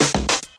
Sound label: noisy, Kick Snare Drum loops